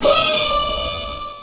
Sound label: horn